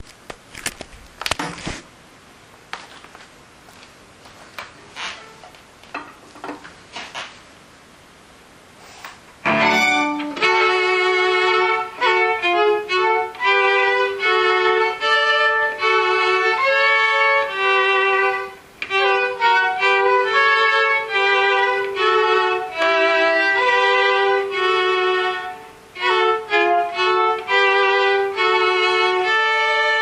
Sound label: hungarian dance